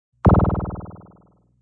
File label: Squiggle; Squiggles